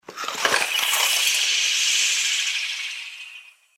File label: eye tentacle; level 3